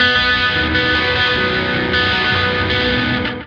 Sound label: Hi Gtr; Lake; Torchlight III; PS4